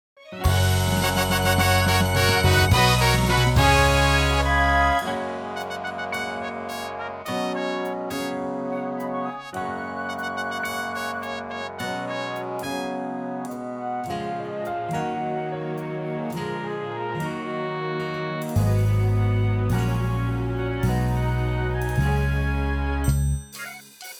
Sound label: accomp